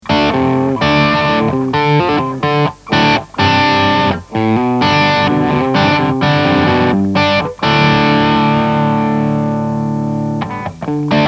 Blackstar gain IFS

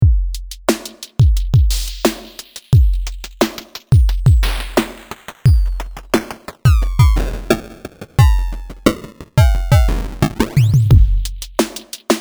Reverb Delay Bitcrusher Sample Redux; Audiothingies DoubleDrummer KoolKit

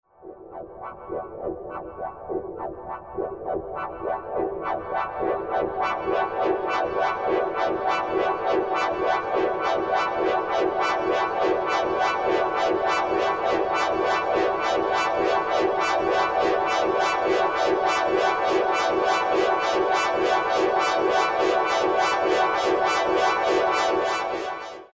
JUNO MX200 FX

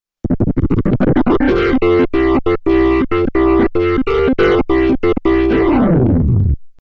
b4000 drive

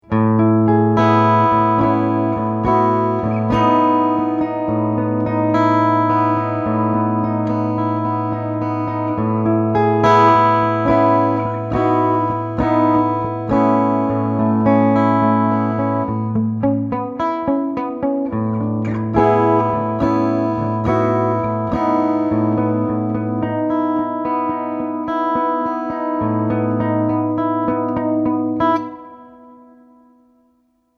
Pigtronix Cosmosis Preset Theatre

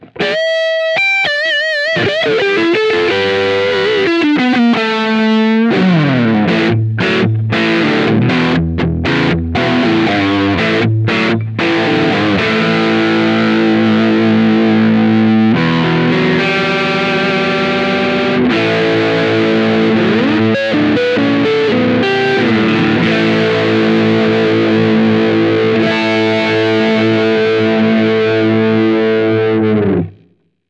Schecter C 1 Exotic Neck Humbucker Drive

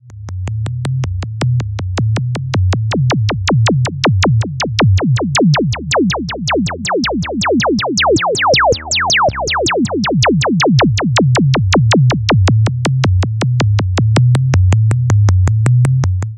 zapp

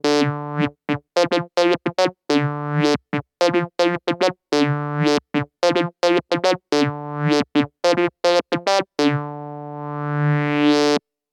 Akai EWI4000s LP HP

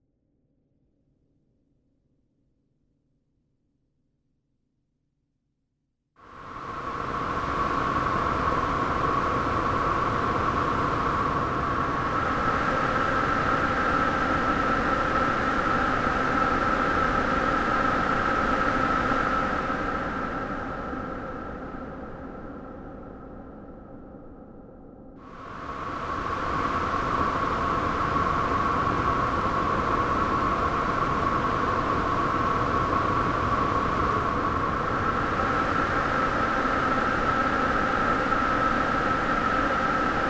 Chromatic Noise with Novation PEAK

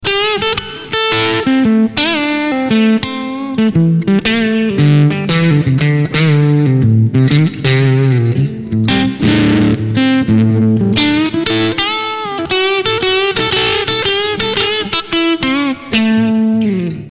bluesy; concorde

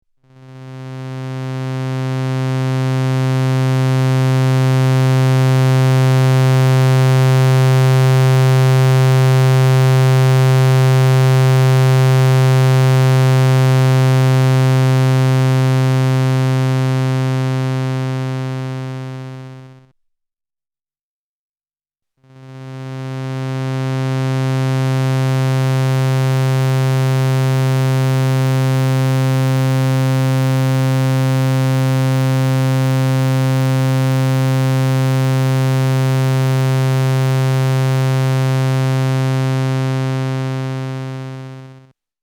Osc1 Level Multidrive

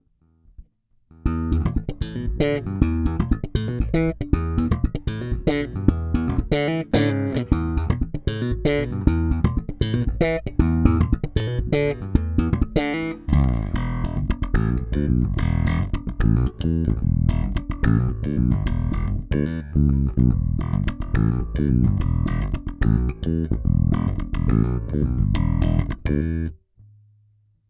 Both Slap

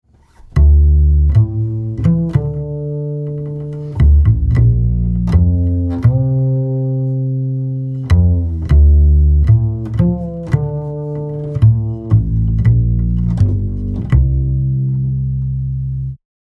Chandler Double Bass comp gr 12 db; Waves

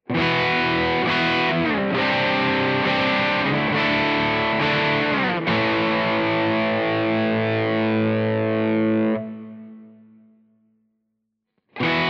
All positions crunch Chords; DAngelico

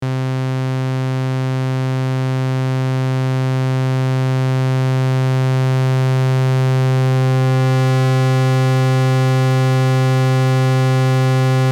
Constant Osc Feedback Level

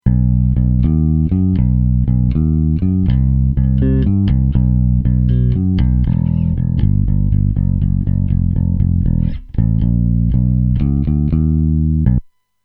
Bass

